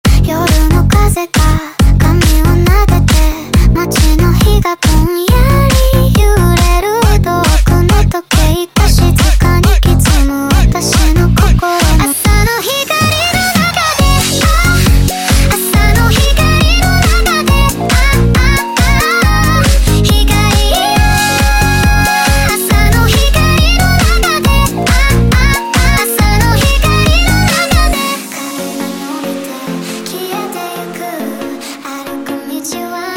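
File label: sped Up Douyin Remix, phonk music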